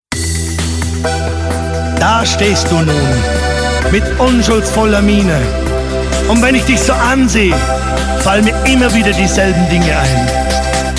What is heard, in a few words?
duett